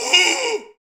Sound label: Bullhorn, Afro Horns, Africa